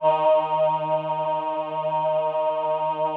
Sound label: VP-330, String Machines